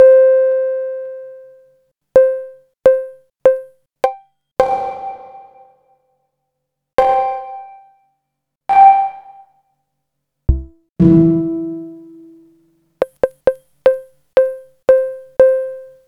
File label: Bongos and Pings